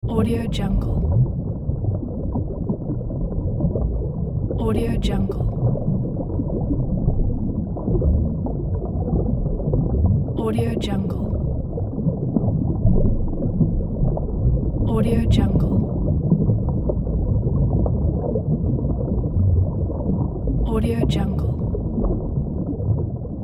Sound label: underwater ambience